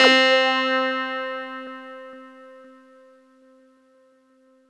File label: CLAVINET; Ragga & Dub Reggae